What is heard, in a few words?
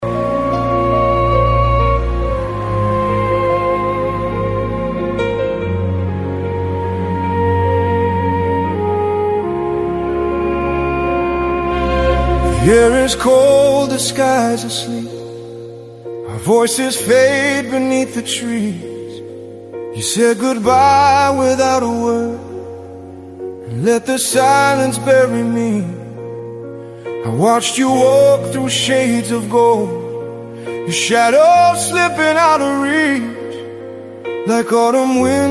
Song Ballad